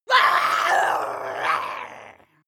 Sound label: witch scream aggressive